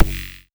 kick made with serum

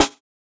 Snare, jungle breaks drum sounds

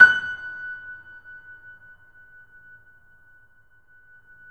Piano, YAMA SUSTAIN; InVision Interactive; The Piano

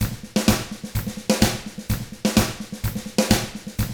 127 Drums

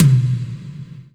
Mid Tom, Percussion; Giga Samples Collection; ELQ-DrumQit

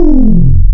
Descending Even, wavetables; Animoog

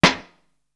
lo gut, snares; Zero-G - Funky Elements

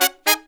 Horns, 110BPM; 90 s Sample CDs; Zero-G; Phantom Horns

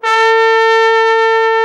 TROMBONE; Best Service Gigapack 1

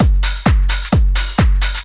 drum 130 techno; Techno Trance Essentials

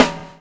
BUBBLE; Spectrasonics - Backbeat

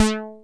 TEISCO, BASSES; Masterbits - Vintage Voltage; VV BASSES

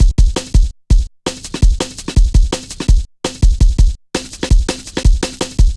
Rollin Shaker, Drum Loops 166.5

